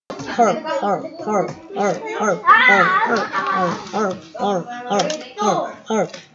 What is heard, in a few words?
harp